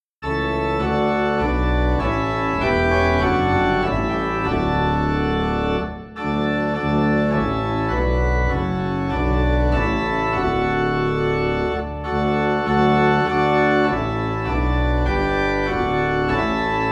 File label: Organ Low in D; Praise God From Whom All Blessings Flow (Doxology)